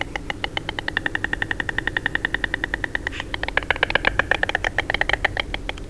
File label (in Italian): Cicogna bianca, Uccelli; Suoni